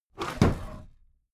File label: desk drawer close heavy